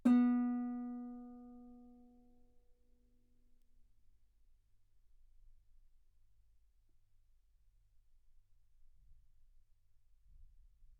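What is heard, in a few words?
Guitar ff B string B3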